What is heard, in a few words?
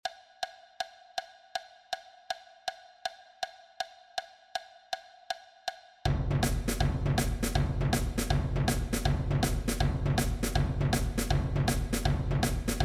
rock anthem perc; percussion